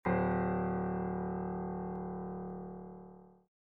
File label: Piano ff D1